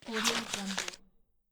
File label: paper magazine page flip